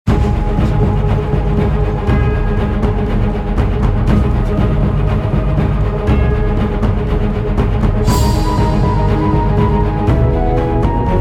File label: Ship Battle; Shadow Fight; android